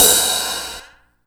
HH OPEN, Fresh Disco House

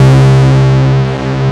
FAT WAVE, ROLAND MKS-80; Rubber Chicken Software Sample Bank

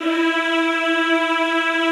E3, Classical Choir; Best Service; Extended Classical Choir

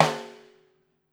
STR SNARE, Brazil Drum Perc; E-MU Producer Series Vol. 7 – Old World Instruments

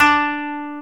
KOTO D3, Asian Traditions; USB Soundscan vol.60 - Asian Traditions; AKAI

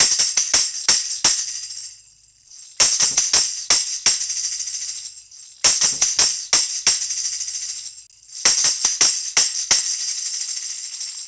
Tambourine, 70s Analog Funk; Sonic Foundry (Sony Creative Software); What it Is! 70s Analog Funk; Session 15 - Wild Child

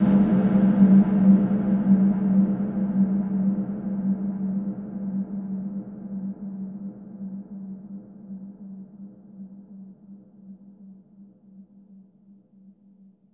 conga, big rooms

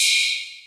JV KIT; Pro-Rec - Synthsonic; JV ANALOG1

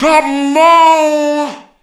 COME VOX, Funky Vocals, SHOUTS; USB Soundscan vol.18

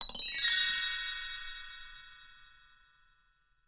CHINESE, BELLS CHIMES; Voice Crystal - Steve Reid Definitive Percussion

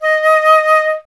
FLT FLTD#, Flute; Miroslav Vitous - Solo Instruments VOL-2

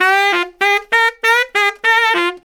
SAX LICK, BRASS LICKS; Ueberschall; Jam Box

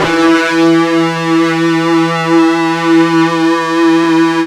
FUZZ E 2, Guitar; East West; Steve Stevens Guitar Samples Collection

